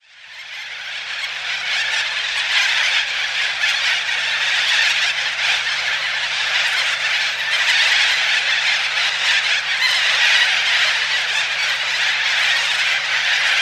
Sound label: corellas halls gap australia